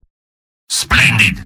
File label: spy positive vocalization; tf; mvm